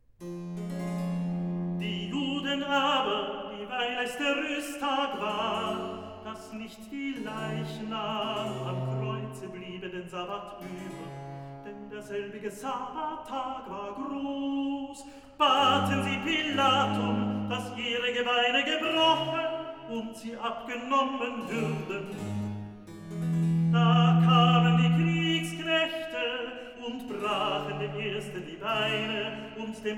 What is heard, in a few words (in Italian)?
Recitativo; evangelist Die Juden aber; Klassika; Handel Masterworks